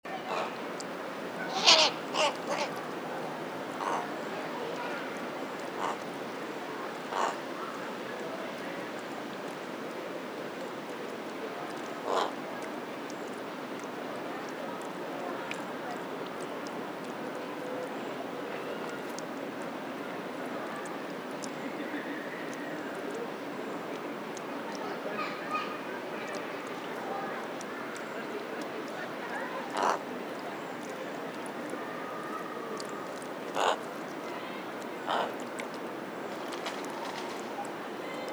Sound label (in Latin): Corvus corone; SETE